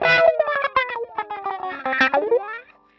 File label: wah grind; PowerFX - Nu Jazz House